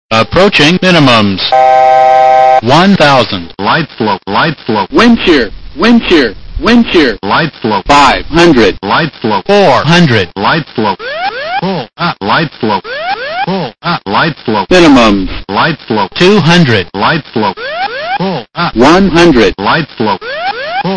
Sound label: Boeing 737 Cockpit; Cockpit sounds – general